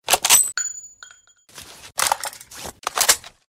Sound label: barrett reload; weapons